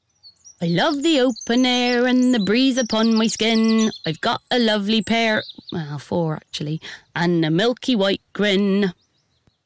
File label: grazing song